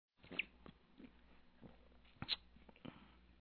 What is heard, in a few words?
Scooting Chairs